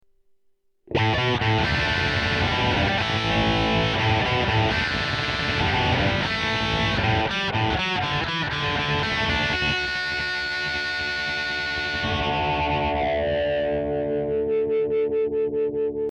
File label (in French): disto wha, guitare